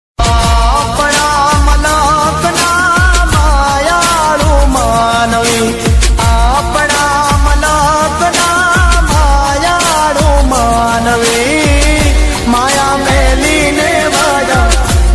gujarati ringtone

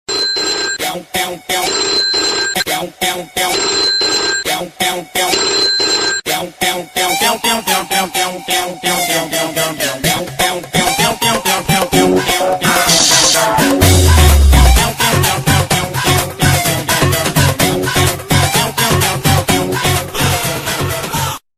BGM ringtone; Bodyguard

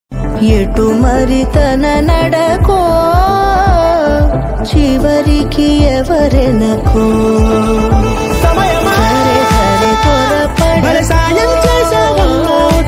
telugu ringtone

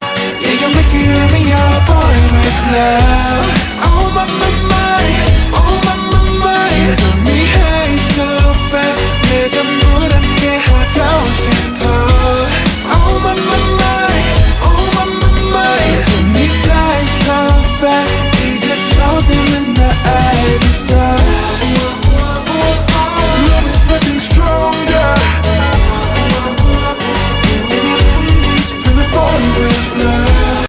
korean ringtone

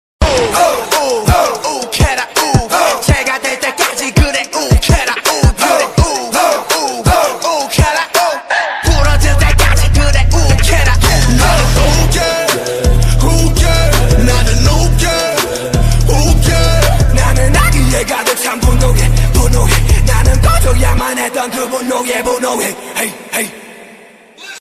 korean ringtone

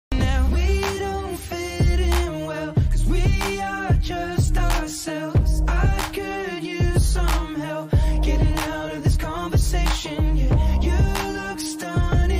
english ringtone

